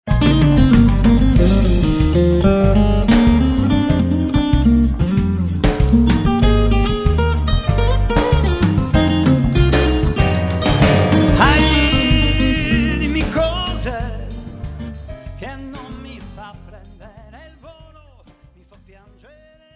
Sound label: Bossa